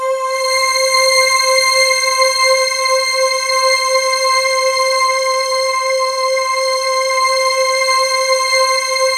STRINGED; MORPHEUS

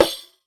Rek Sak; Roland BK-5; Percussion

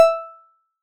Blip; Bitkits; Blip & Blop

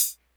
hihat, Hardcore Breakbeat; Big Fish Audio; Hardcore Breakbeat Action

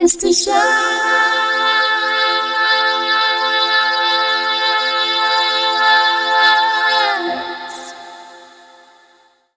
VOICE FX, Dance Vocals

